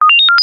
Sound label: science fiction robot beep